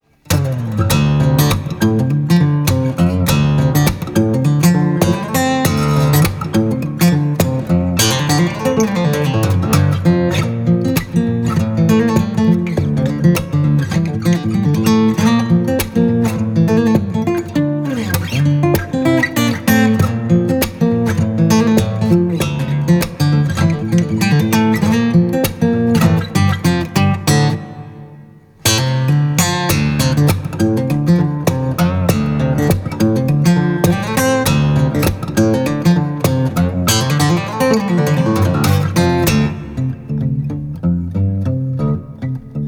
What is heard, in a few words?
Funk; LA